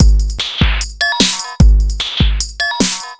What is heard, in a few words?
Beat, Drums